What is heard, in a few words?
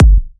Bassdrum; Vengeance Samples